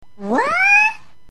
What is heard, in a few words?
section fail; minionalist